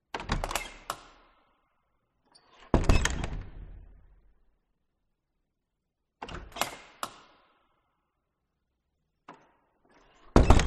Door Abandoned Mansion Hallway Perspective Reverberating Old Wooden Door Open; Skyes Audio